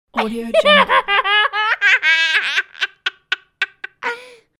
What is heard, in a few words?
nasty kid laughter; fxprosound